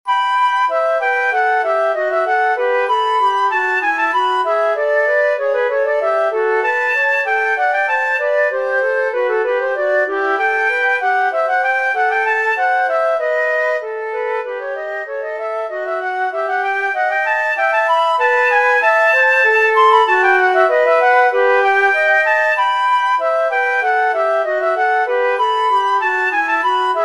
air, hornpipe

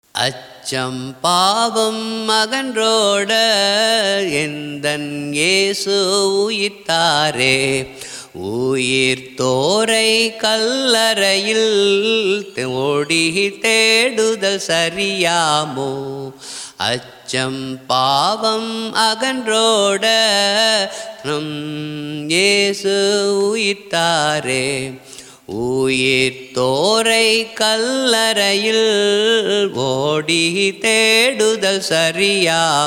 easter hymn; s john barathi soundararajan

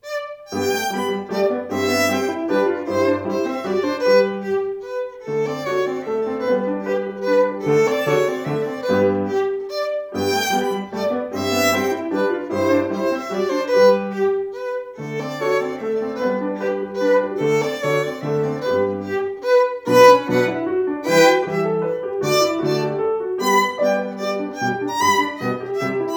german dance; beethoven ludwig van

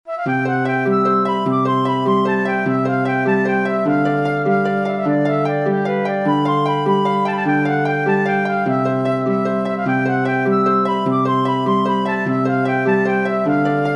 jig for flute harp

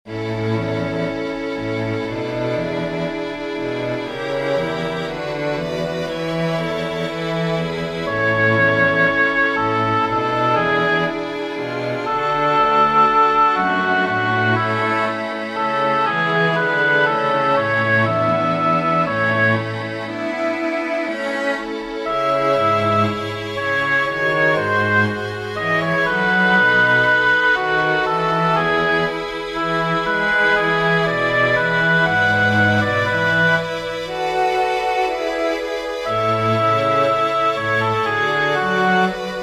for oboe strings; schubert franz peter; sylvia

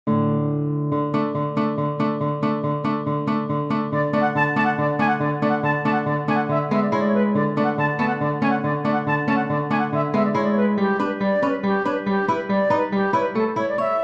romanian folk dance for flute guitar

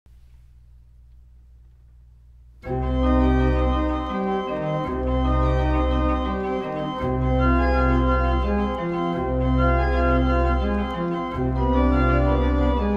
prelude moll organ transcription; Johann Sebastian Bach; bwv 999

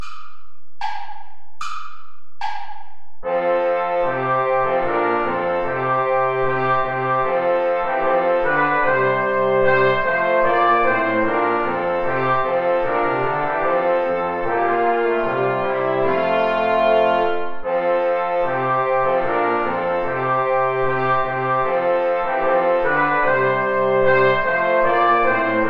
play along without trumpet; friderici daniel; wir lieben sehr herzen

